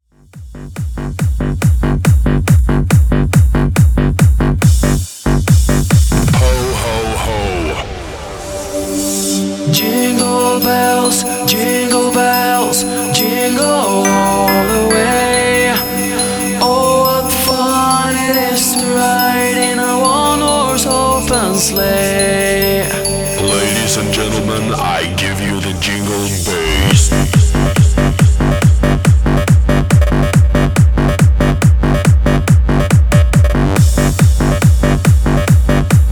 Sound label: Jingle Bells Techno Re drum